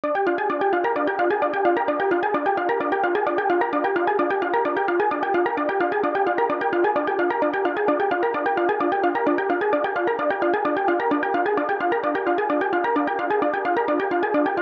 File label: SYNTH